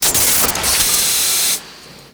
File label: heavy death; Dystopia